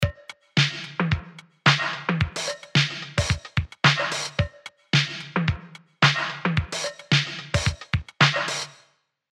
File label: Tape Metal Analog; Arturia CMI V; MELLO FI